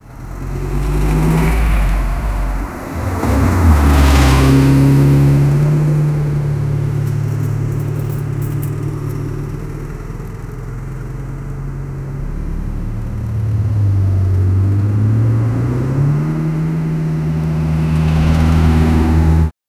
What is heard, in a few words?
convoy of old cars with; automobile